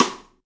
Pop Stick, Rimshots; Roland BK-5

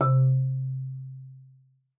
MARP C3, MARIMBA; Xsample VOL-4

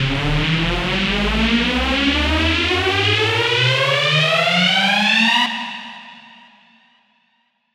Tone Riser (C2)

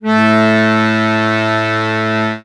ACCORD A1, Accordions; USB Soundscan vol.40 - Complete Accordions; AKAI

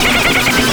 VOX LAUGH; NES DPCM; MIDINES

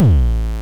FM Kicks Distortion A1; Drums; LookIMadeAThing Kicks - Vermona Kick Lancet

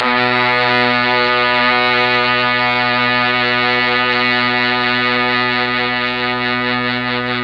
B1 Brass, Mellotron MKII; Mike Pinder - Mellotron Archive CD

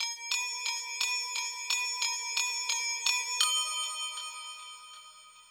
Hip Hop and R&B Drumloops; Best Service ProSamples vol.01; AKAI

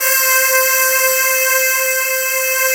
girl C5, vox; FAIRLYVOX; Synth

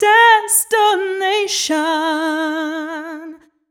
f mel e flat, fem Vocals

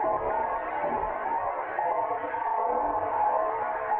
Tonal Re Draw, sparse soundscape

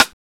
LINN RIM HI; SIDESTIKS

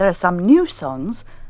VOX GENERAL; Megabass Remix! 2

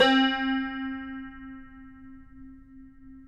CUMBUS C, Guitars; Spectrasonics; Hans Zimmer Guitars VOL-2

